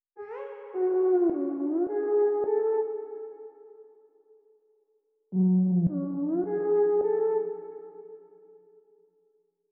105 BPM F# Min Atmospheric Lead; trap; Melodies; Loop Stems; Cymatics; Trap Starter Pack; Apollo

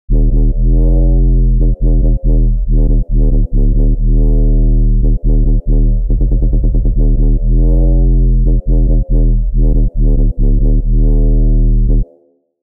140 BPM D# Min Bass, UK Garage; Melodies; Loop Stems; Cymatics; GHOST (Beta Pack); Speaker

